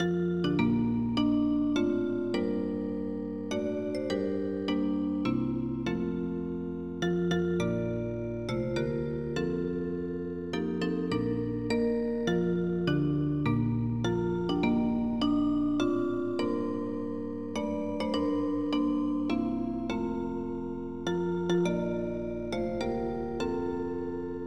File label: anthem; Massive MIDI Collection